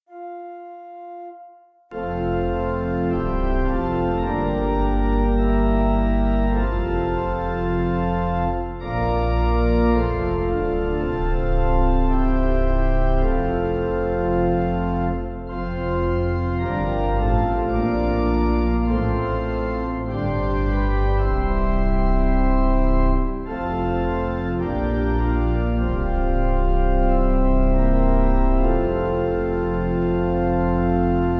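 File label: Pipe, Piano, Band, Pipe Organ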